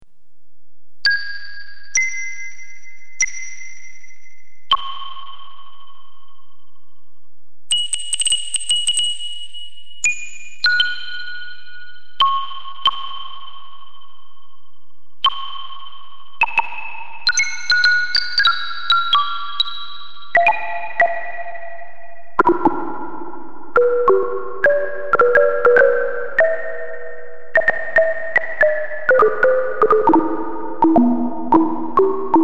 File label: piano sonata